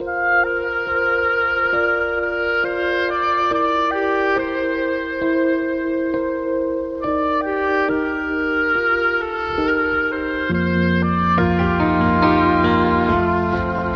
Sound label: flute fall in love again, Loops, Sad